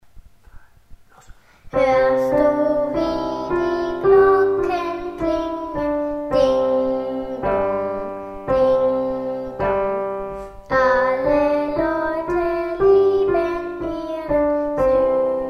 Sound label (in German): Glockenlied